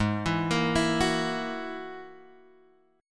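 A flat 7th Suspended 2nd Sharp 5th A flat Guitar Drop D, chord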